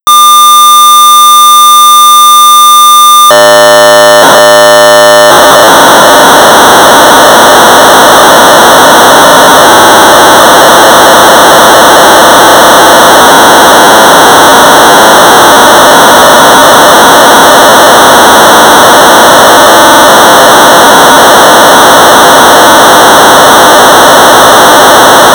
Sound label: Sorcerer; Disas v 2.0 (a disassembler); Ivan Reid; possibly modified by SCUA y-b 26; BASIC